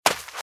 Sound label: Footsteps - Sand - Dry - Run - Right; RadwinIsland